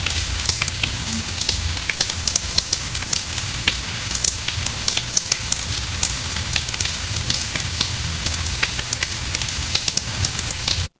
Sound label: ambience rain